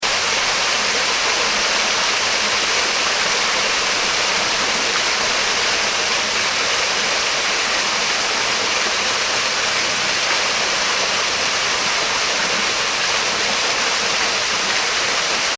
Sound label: water waterfall small; Rivers; Nature; Waterfall (Small) 8; McKinney Sound